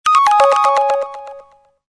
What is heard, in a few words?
Zoom Out